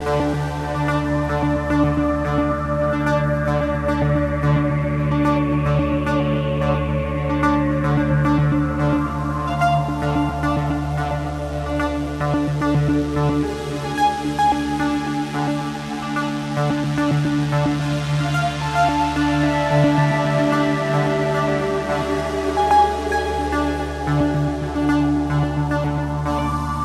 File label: Moon Patrol Pad Loop; Headlander